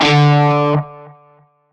Shawzin Oct Two D; Music; Warframe; Narmer Shawzin

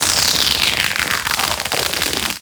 Fist Projectile; Enemies; Warframe; Tyl Regor; Fists

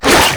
Infested Runner Arm Attack B; Enemies; Abilities; Warframe; Proto Infested

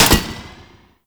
Axe Recall Catch; Warframe; Weapons; Tenno; Sundial

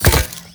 Moa Step; Warframe; The New War; Characters; Veso

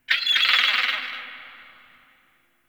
Bird Seagull; Warframe; Eidolon Plains; Ambience

